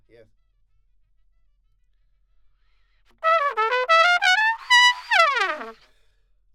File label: Trumpet